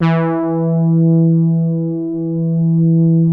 poly hard E3, soft brass; Music Store - Claudius Diemeter Schwerlos

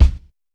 BDV1 -R, Drums Acoustic; AKAI; 90s sample CD; USB Soundscan vol.10; RAW KIT 4

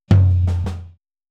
Boogaloo - Outro - Tom Floor, 82 BPM; Sonic Foundry; Drums from the Big Room The Mixes

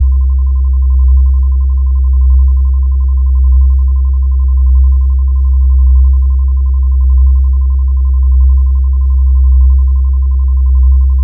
ELECTRIC, Special FX Twisted; USB Soundscan vol.14; AKAI

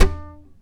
Tap, Flamenco FX

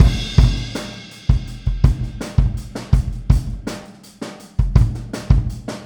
Boogaloo, Kick, 82 BPM; Drums; Verse; Sonic Foundry; Drums from the Big Room The Mixes

